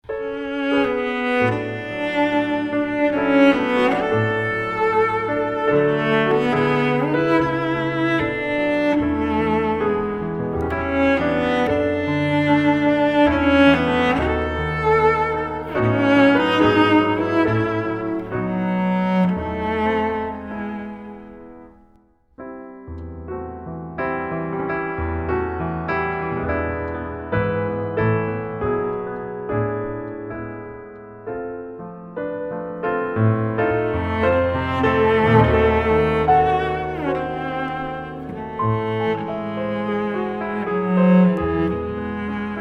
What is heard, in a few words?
Cello; Brahms